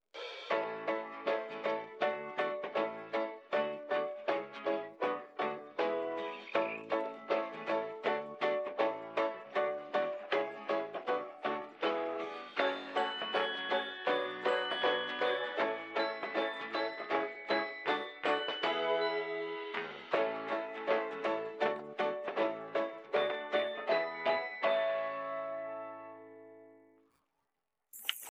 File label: Commercial Song no vocals